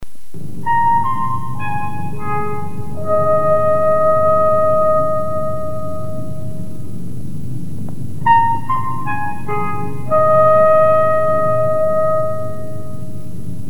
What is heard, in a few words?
Jingle; Movie Clips; Close Encounters